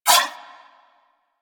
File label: Nunchaku Whoosh; Weapons; Warframe; Tenno